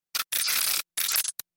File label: glitch